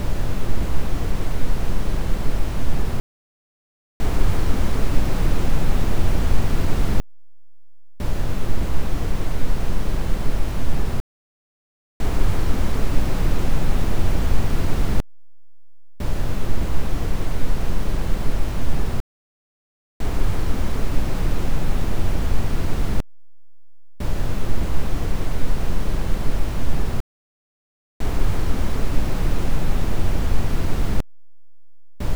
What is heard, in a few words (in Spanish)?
marron, contaminacion ruido